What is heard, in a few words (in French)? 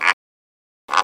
flamants